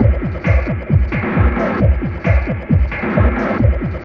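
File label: drum loop; X-Static Goldmine 3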